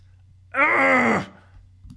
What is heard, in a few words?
Arrrggh